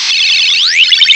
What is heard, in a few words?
WARGAME; MACHINES; CMI Sound Library Rev 1.3